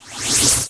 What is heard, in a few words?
ROCK